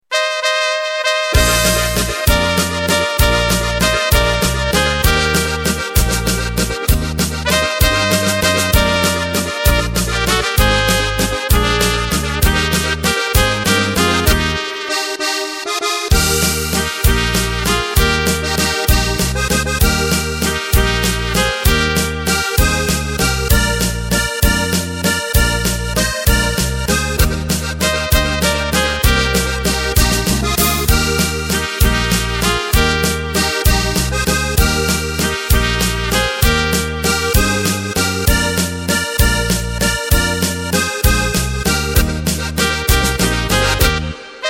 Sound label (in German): Drums Playback, midifile